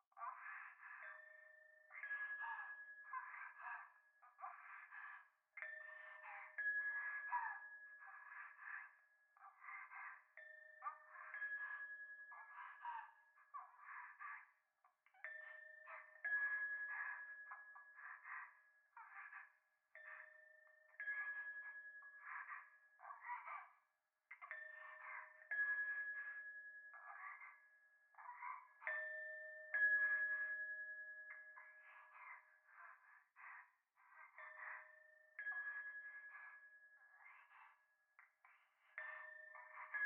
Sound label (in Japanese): 風呂 右耳密着処女喘ぎ 神社